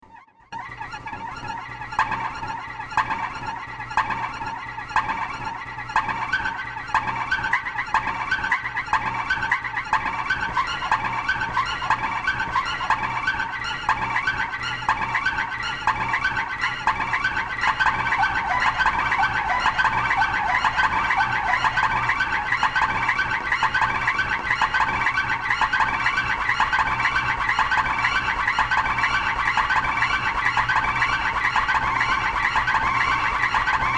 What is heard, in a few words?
Soft Shoe